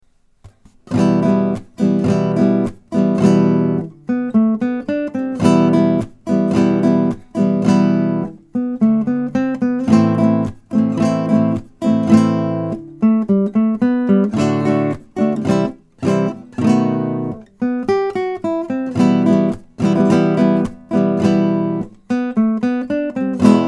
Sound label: Tiger Tango